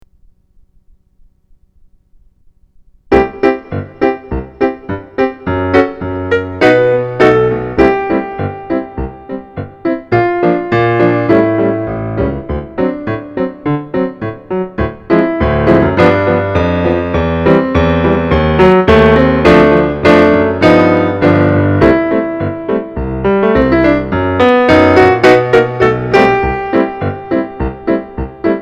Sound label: Quick